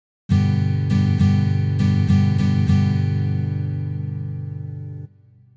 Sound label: ultimate strum, guitar